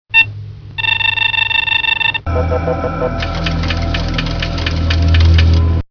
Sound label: esper sound